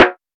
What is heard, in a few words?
natural snare drum f sharp key